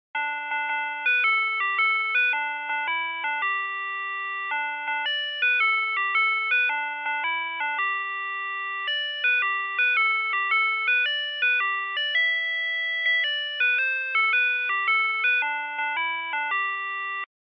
Crosswalk Signal

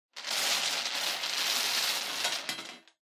Bingo Machine Spin Drop

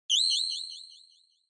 Onoma Flash Delay

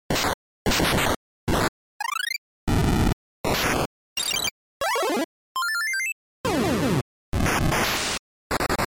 NES RPG

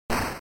General Noise Single

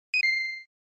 SNES Racing Item

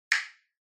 Castanets

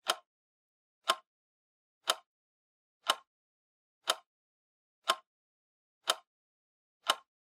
Clock Second Hand Dry Loop

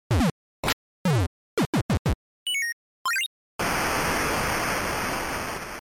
NES Fighting

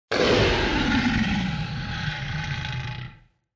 Monster

